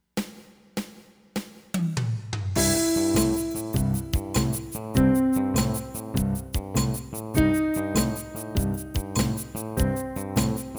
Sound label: Blues